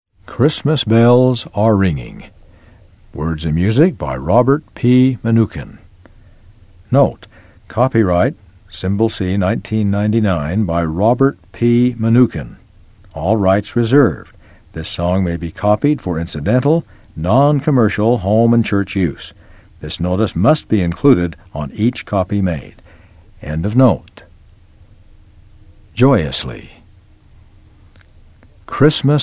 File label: christmas bells are ringing; new era december 1999